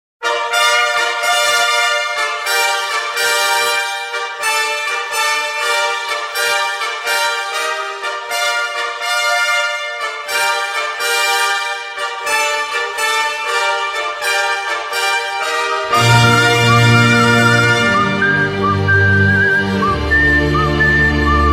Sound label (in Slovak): Snové fanfáry